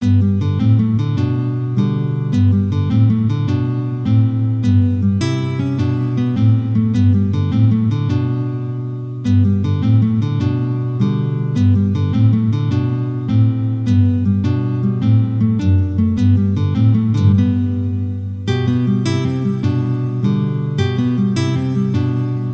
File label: triplet melody